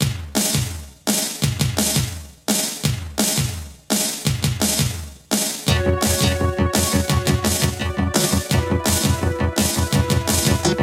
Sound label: pitchy